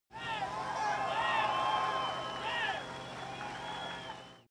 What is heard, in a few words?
cheer men shout